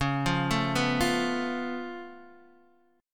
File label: C sharp Augmented 7th C sharp Guitar DADGAD; down; chord shape